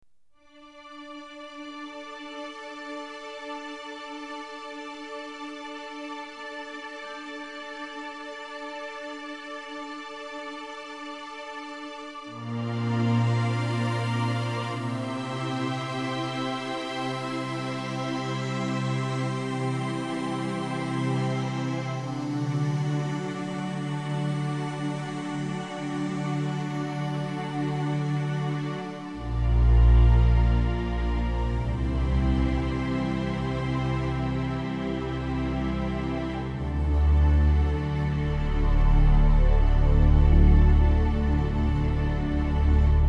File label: End Jingle